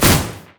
shadow swing; LoL; zed stormninja

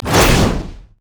flamebreath human; LoL; shyvana

